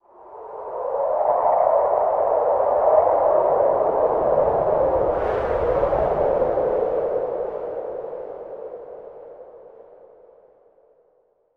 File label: wind gust eerie haunting